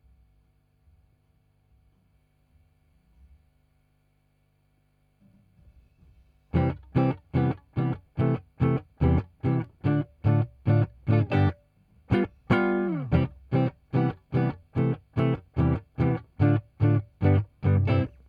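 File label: Guitar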